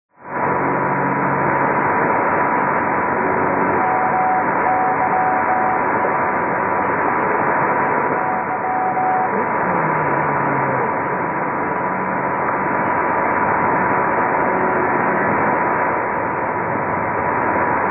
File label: 6220kHz Mystery Radio